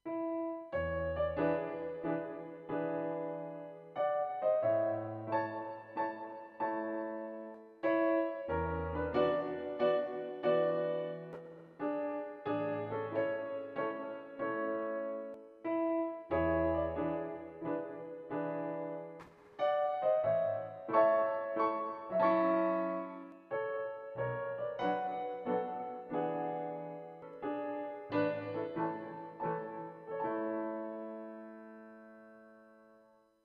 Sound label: Prelude in A, piano